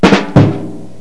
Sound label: Rim Shot